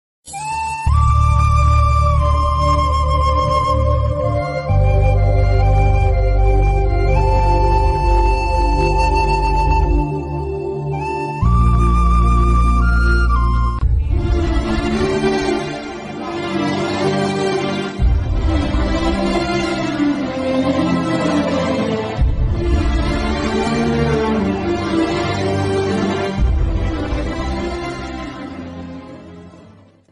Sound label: sad ringtone sad bgm emotional ringtone; movie bgm; arya; allu arjun